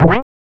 Bounce Back; FREE SFX PACK VOL 3

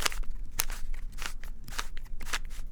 Big Cube - Twist, Rubik's Cube

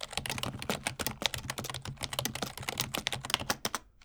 Keyboard - Typing; Keyboard Sounds; Lots of Swears

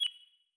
back style 5; inteface sfx pack 1; Back tones

